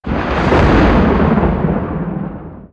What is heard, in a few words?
battle; RYL